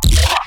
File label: glitch stab; Virtual Riot; Glitch Stabs